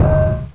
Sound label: bounce; Hexen2; assassin